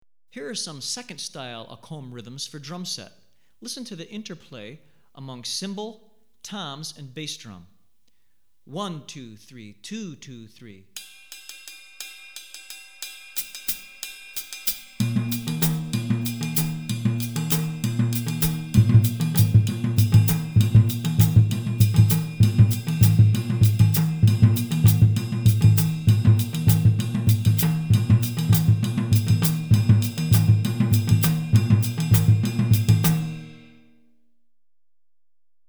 Akom, Second Style Example, West African Rhythms For Drumset; Hartigan